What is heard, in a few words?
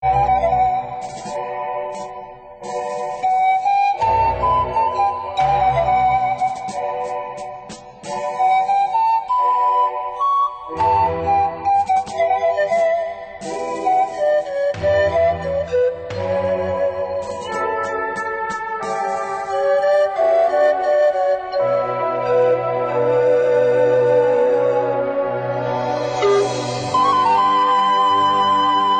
Panflute